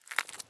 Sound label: jump glass; Escape from Tarkov